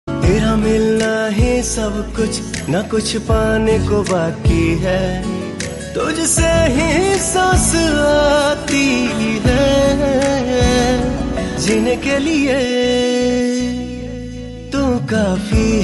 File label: hindi